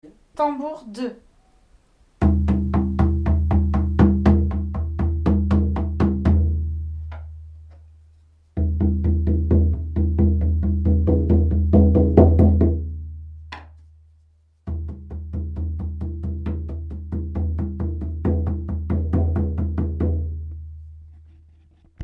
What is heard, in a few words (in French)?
tam cheval